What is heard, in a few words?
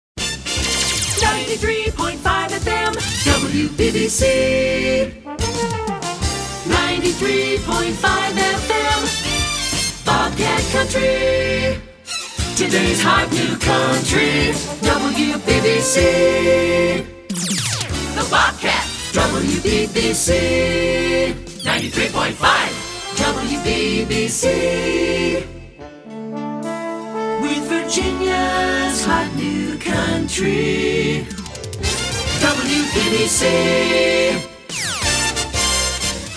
Jingle; Short Attention Jingle Show